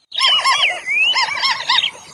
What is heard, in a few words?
White-browed Crake calls, Tambun Interior Perak Malaysia